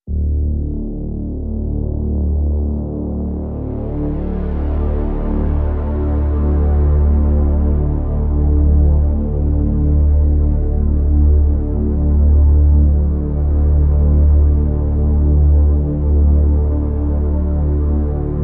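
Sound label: Drn D, drone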